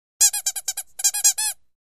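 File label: Rubber Squeaks High pitch, squeaks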